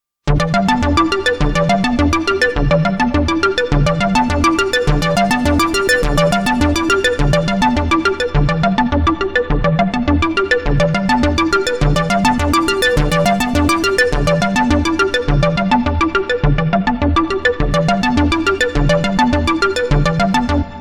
Reswacker

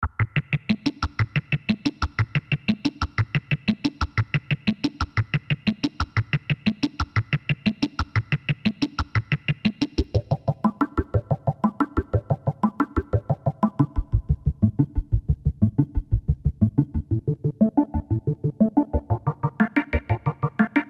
Blips

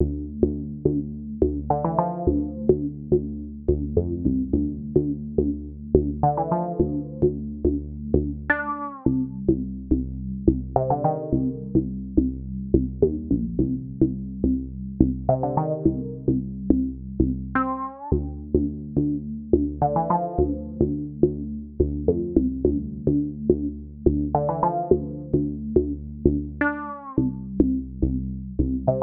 Tongue Bass

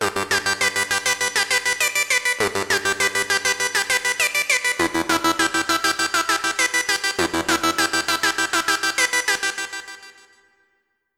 Throaty Pulsator

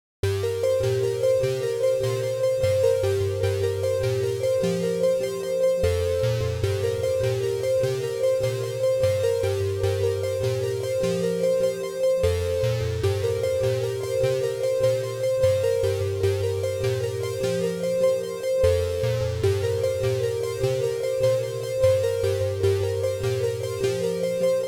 phasichord

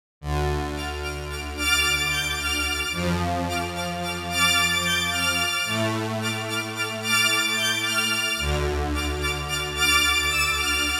String Choir, Roland JU-06; strings; SoundDiviner Project; Roland JU-06 Pack04

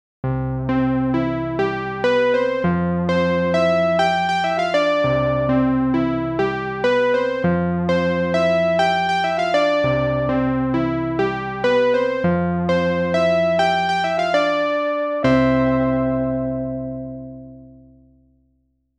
70s E Piano, Roland JU-06; pianos; SoundDiviner Project; Roland JU-06 Pack05; DigiD pianos pack

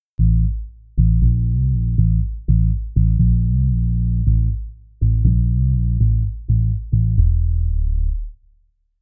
Synth Bass

